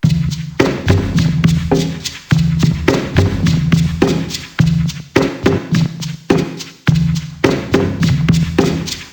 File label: percgroovefx 105, Perc Grooves; Slate; SD SEISMIC Drum Sample Pack; Signature Perc Grooves